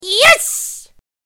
jump; freak fortress 2; cream

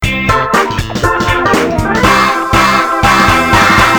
pre minigame; tf; microtf2; mona

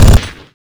shoot; tf; lucy

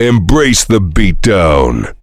heavy laugh happy; tf; duke

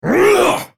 spellcast effort; Volibear

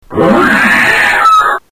spawn, zombie; dod

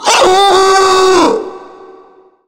charger charge, zombie; Left 4 Fortress

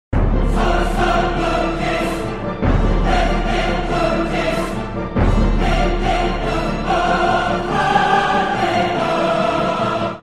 epic; taunt packs

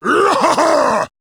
laugh; garrosh

